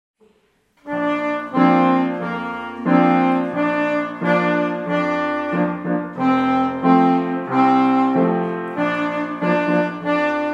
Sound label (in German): Saxophone und Querflöten; Merrily we roll along; Fortgeschrittenenkurs Klasse 5-6